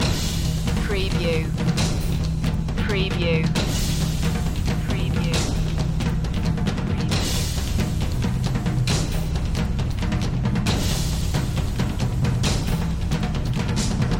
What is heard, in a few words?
samurai percussion loop